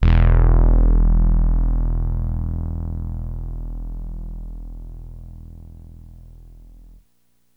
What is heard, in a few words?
Bass, Drum N Bass; Sonic Foundry (Sony Creative Software); Knutrix Drum & Bass